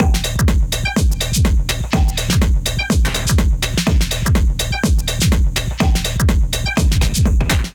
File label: Kit 124 bpm; Branded Beef Kit; Ableton; Punch and Tilt